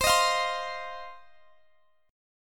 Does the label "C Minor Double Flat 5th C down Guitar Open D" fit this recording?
Yes